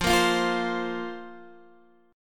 F Suspended 4th F, Guitar DADGAD

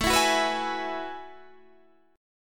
F sharp Minor 11th

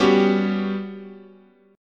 G Major 7th Flat 5th, guitar chord

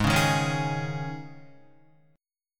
Ab Suspended 4th, Guitar; Listen to Absus4 strummed; JGuitar

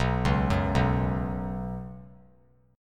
B Suspended 2nd, chord; Bsus2 arpeggiated; 3C,3F,3A,3D; 11,8,9,9; JGuitar

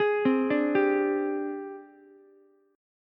G sharp Major 7th Flat 5th Ukulele; JGuitar

